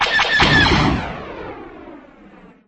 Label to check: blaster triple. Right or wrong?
Right